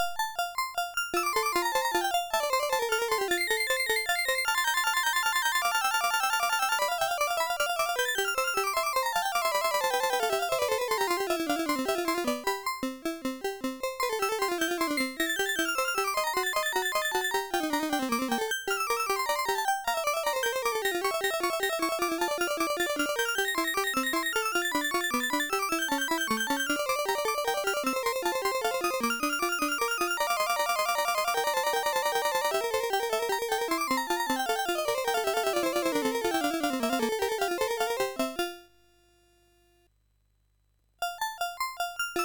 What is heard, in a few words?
Invention No 8, MOS6581; MOS6581R3